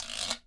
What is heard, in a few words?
GUIRO